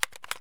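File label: ak magazine in fail; Escape from Tarkov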